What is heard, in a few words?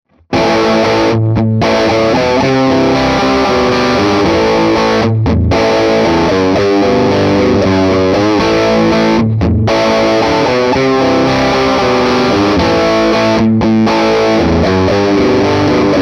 Amps Suite AC20 Cut Vol full Bright on Bass Cut off